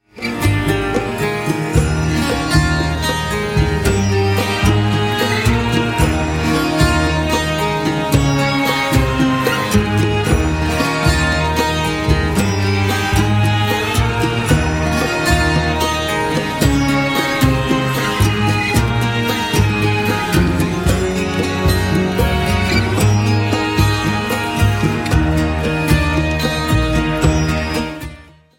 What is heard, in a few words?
ride